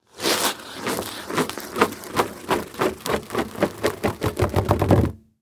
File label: Impact Tire Roll On Cement; Impacts